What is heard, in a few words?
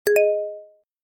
Notification Sound Effect